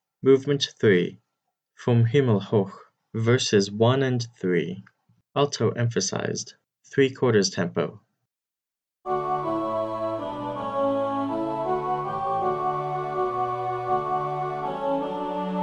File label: alto emphasized three quarters tempo, choir